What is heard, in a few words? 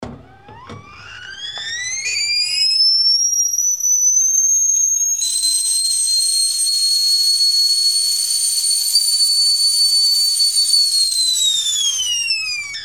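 coffee pot whistle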